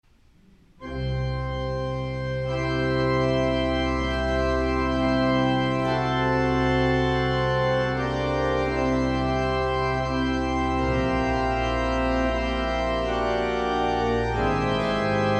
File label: Organ; St. John's Cathedral, Jacksonville Florida